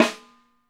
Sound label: TIGHT, snares; Sampleheads - New York City Drumworks VOL-1